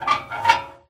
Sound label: door hatch squeak open; ProSoundCollection v1 3 MONO